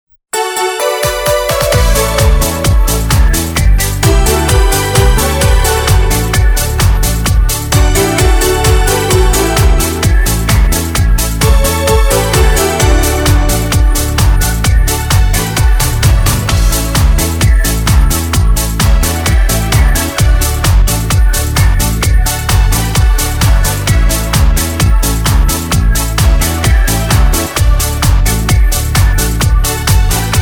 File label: Korg Pa3X